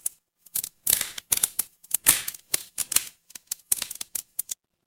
fire electric crackle

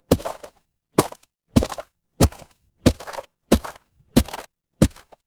footsteps gravel

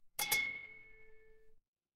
glass clink

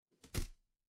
impact slam

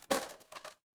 impact crash

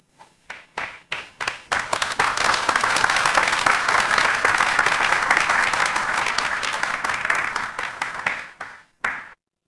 crowd applause

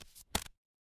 paper fabric card flip

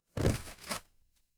paper fabric inventory

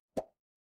cartoon pop

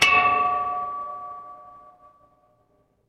instrument gong

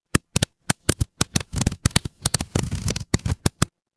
fire electric crackle

